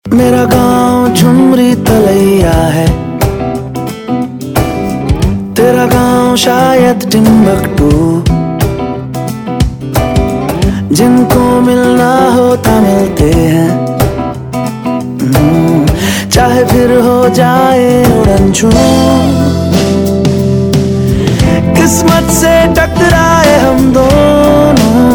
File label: Ringtone